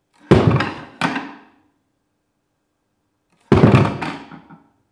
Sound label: Wooden Chair Falls On The Ground